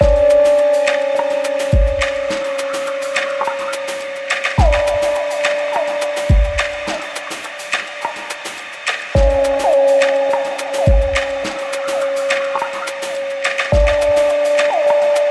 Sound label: construction loop meditation chill; drum loops